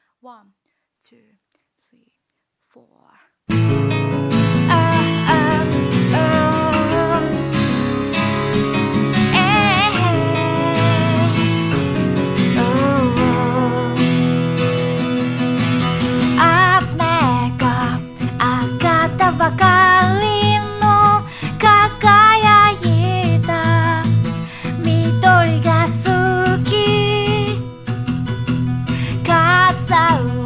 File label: Acoustic Vers; JPN; Akatsuki Records